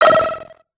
alert; fireman jet

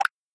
button click; puppet hockey

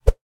woosh; spaghetti

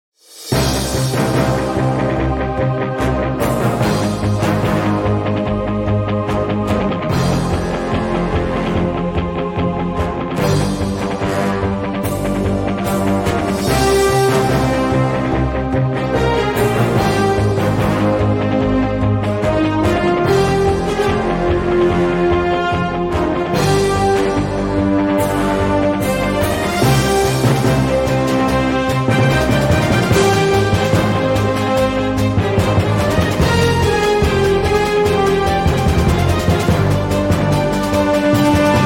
battle theme; brawl guys2

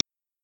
click old; rabbit samurai 2

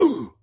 hit

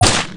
shoot; war zone

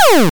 fall; hexagon glitch 3

